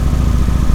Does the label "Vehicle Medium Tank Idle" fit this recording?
Yes